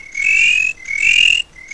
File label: ANIML; Zero G; Man Machine